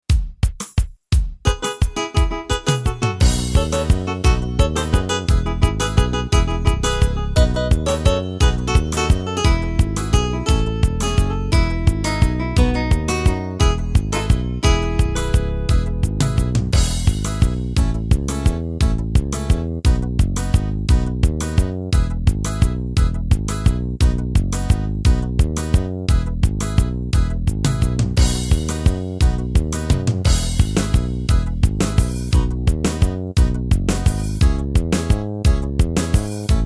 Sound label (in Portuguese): ritmos yamaha rasqueado sertanejo